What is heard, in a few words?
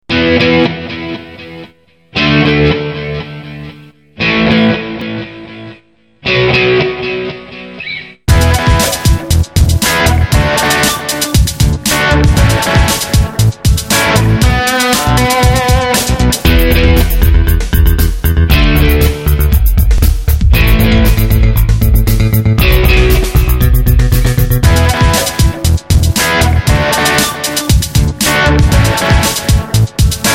Rock Guitar